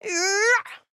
Medium

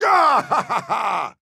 Attack Large

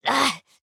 AHurt

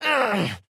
AHurt; Fern

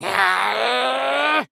Stripe Charge

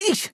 Hurt; Garnet

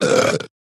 Burp

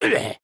Land

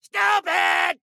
Combat

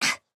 AHurt; Harley

